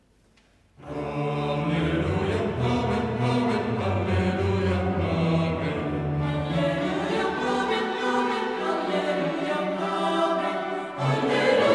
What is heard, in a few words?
Halleluja, Amen